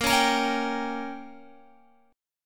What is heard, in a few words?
Bb Minor 6th, Guitar; Bbm6 strummed